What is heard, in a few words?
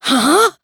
huh